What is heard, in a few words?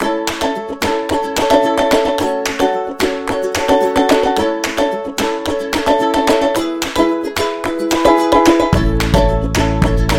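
Happy Kids Fun, Kids background music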